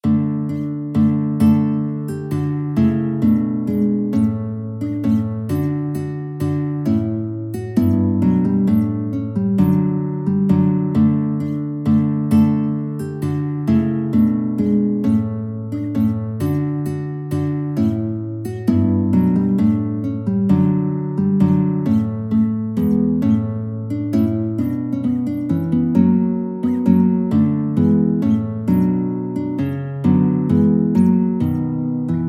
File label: guitar